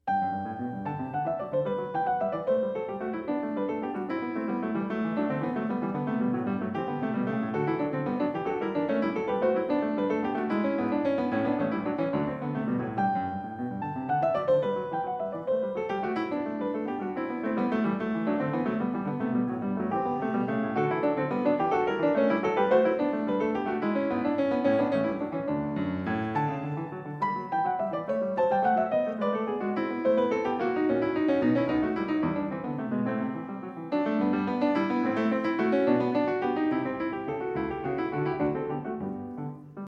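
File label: Goldberg Variations, Canone alla Seconda, piano; Bach; 1982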